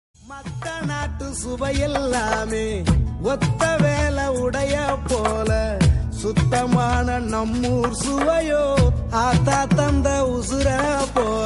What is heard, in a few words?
tamil song